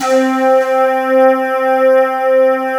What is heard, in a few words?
wave blow C4